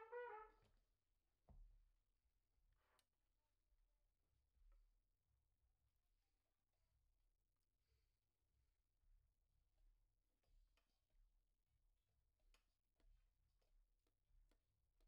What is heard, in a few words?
Trumpet